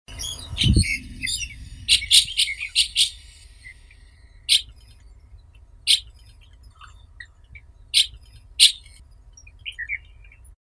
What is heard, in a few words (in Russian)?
zvuki prirodi skvorets kitaiskii sturnia sinensis